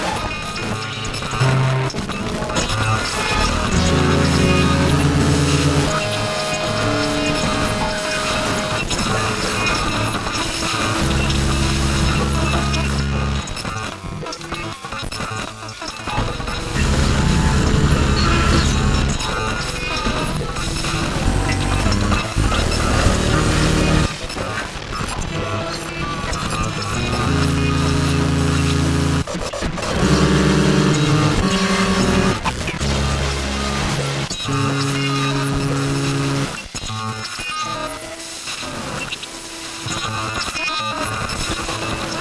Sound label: Casio CT660; circuitbending